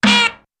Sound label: Bang Whonk, impacts